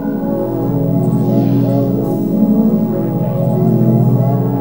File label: Traenon Pad - 12A; Jungle; Pads; Blu Mar Ten; Jungle - 1989 to 1999